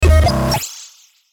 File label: Digital Warble Ascend Sweeper; glitches; Blastwave